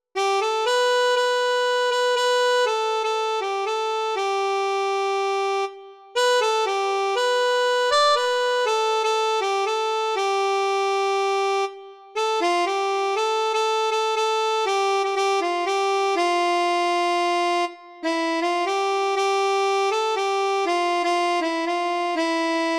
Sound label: harmonica